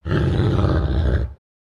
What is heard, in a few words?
Wolf aggressive growling